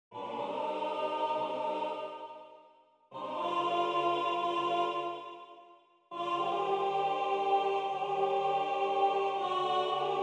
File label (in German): Gloria mit Soli Alt